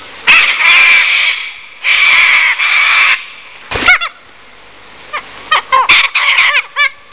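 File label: Slender Billed Corella, Cockatoo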